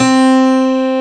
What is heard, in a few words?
PIANO G2; AKAI S6000 CD-ROM - Volume 1; MIX PIANO